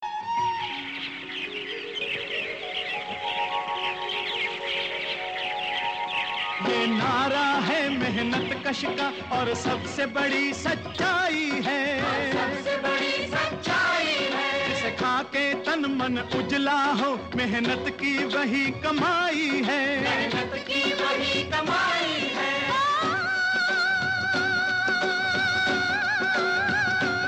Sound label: female male